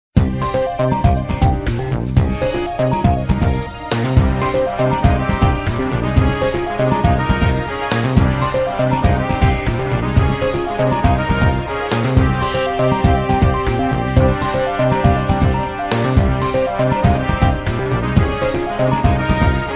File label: Airship; Super Mario Maker